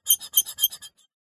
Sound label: knife tip scrape scratch metal screech piercing fast